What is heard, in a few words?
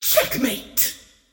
scream great; queenofpain